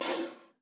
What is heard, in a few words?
Soft Slide Decelerate